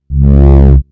Bass Hit

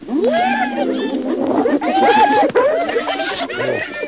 Hyenas Laughing

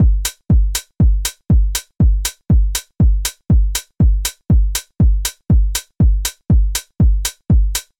Hard House Loop

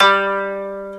Japanese Koto in G2

